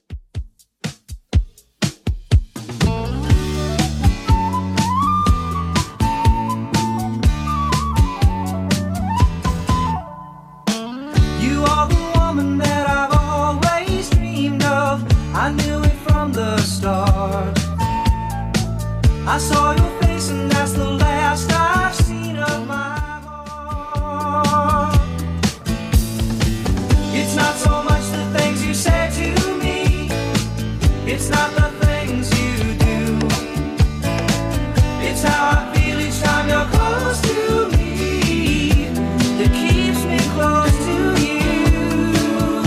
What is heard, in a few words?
70s Redrum 122bpm